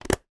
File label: animals fish food flakes plastic tub lid close